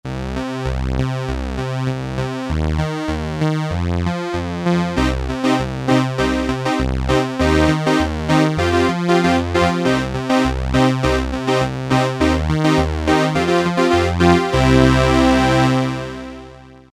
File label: Synth 2 - Osc. One slight detune, '80s style, Crumar Trilogy